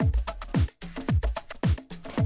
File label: Classic DrumLoops, 110